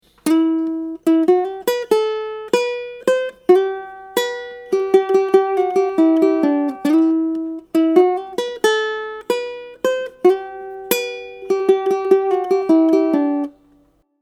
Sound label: ukulele